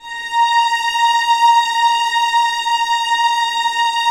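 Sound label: 1st violins sustain A#3; Sonatina Symphonic Orchestra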